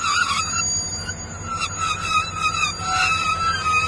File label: tram squeak; Garry's Mod; hl2